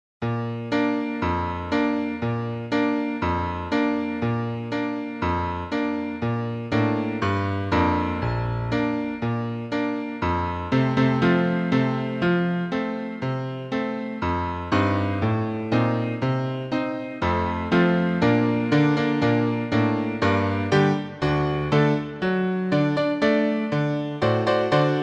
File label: piano